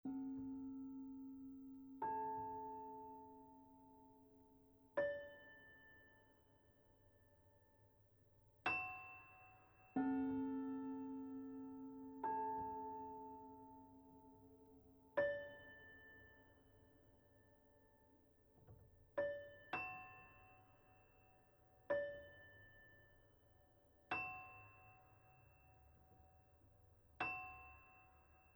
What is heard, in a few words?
Prelude for meditation; CAGE; Live